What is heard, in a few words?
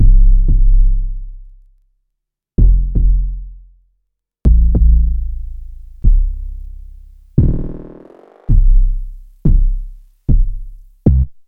Bass c